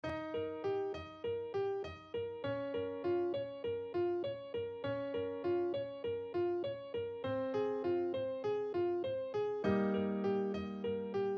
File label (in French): Piano Débutant 100bpm